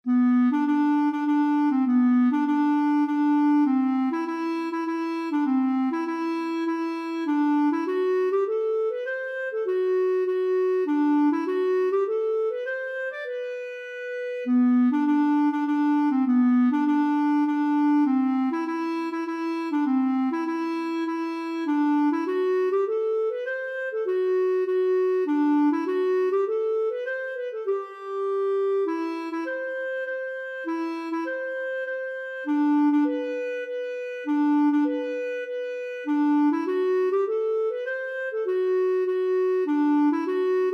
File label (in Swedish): Polska; Ingrid Dardels